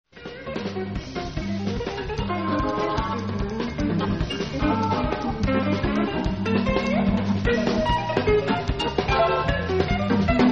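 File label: guitar solo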